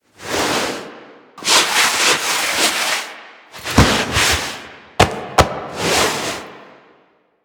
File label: Ram Foley Pet; Animals; DE; Warframe; Quests; Duviri